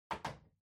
Calculator (Far Put)

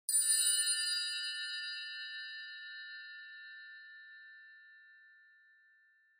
Cluster Chimes (Reverb Gentle Short)

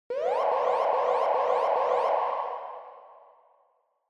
Warning Siren, Reverb Low Mid

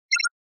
Cyber

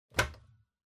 Power Strip Hard Unplug

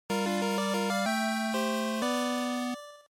GB RPG Event

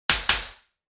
Hit Slap, Dry; 打撃 平手打ち04-3(ドライ)

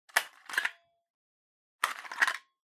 Tabletop Timer Digital Battery Remove; 卓上タイマー デジタル01-10(電池 取る); タイマー機器

